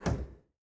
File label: stick roll lim, aircraft; DCS